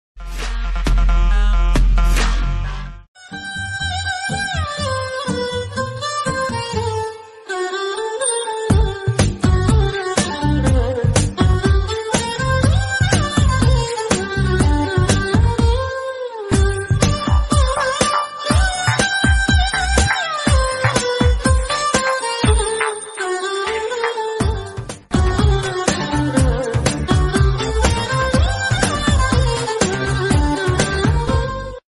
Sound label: violon bgm ringtone; iddarammayilatho